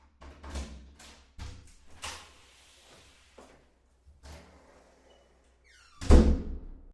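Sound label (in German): Haus tuer rein, house door in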